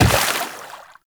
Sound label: Tenno Run Water, Footsteps; Warframe